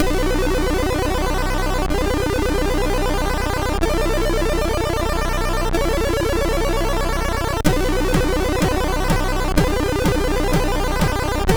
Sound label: Music sid MOS6581R3; Just Music